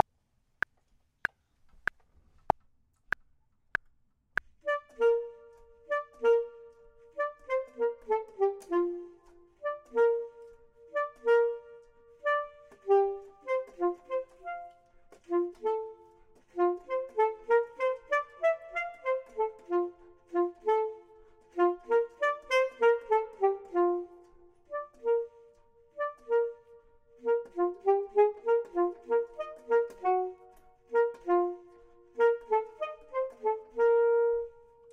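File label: top, Saxophone and Clarinet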